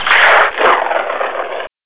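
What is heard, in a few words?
crash, cars; vdrift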